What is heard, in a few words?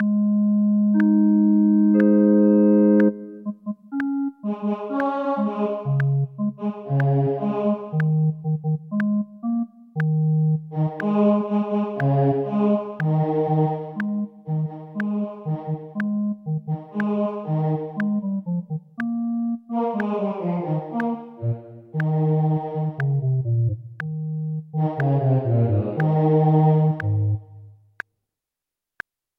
O clap your hands; Gibbons